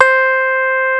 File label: FUNK SOFT C5, DIGITAL BASS; Music Store - Claudius Diemeter Schwerlos